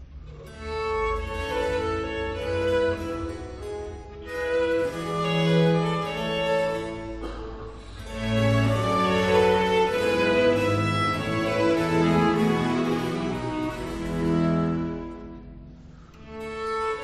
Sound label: Concerto grosso B-dur, Sarabanda, Largo; Arcangelo Corelli